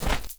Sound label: dirt land, footsteps; chief